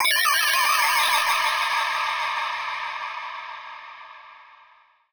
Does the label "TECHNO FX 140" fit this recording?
Yes